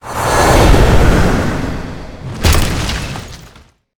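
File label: armdemon spawn, monster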